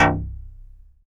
MTL, SYNTH BASS; East Collexion; Bass S3000

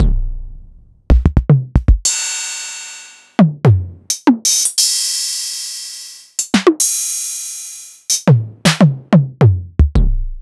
DMX606

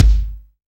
DX MIX BD, DRUM; AKAI S6000 CD-ROM - Volume 1

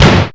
SDX GATE KICK; Greytsounds VOL-1; SDX KICKS

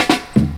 Fills, Roland; Sound & Vision - Gigapack I; Perc

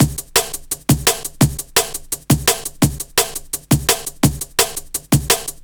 Jazz Break, Drum & Bass; Fluid Dynamics

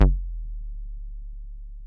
bass stabs; FutureRetro FR777

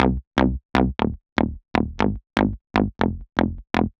Acid Bass; Sonic Foundry (Sony Creative Software); The Electronic Music Manuscript Collection; Bass Foundations

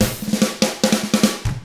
rock drums

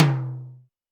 Tom Hi Ring, Alesis D4

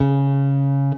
Guitar & Bass; Quantum Leap

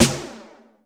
TEK.S, TECHNO; 90 sSampleCDs; Crypton - DrumBase5000

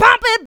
Female Vocals; Houseworx

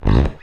URP, Electric Basses; PlatinumPhattCD2; E-MU Producer Series Vol. 8 – Platinum Phatt

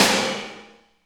SNR, Tekno Industrial; East West

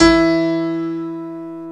PNO, SY PIANO, House; Best Service ProSamples vol.10; AKAI

